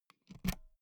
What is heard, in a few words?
pull out euro plug; objekte; elektronik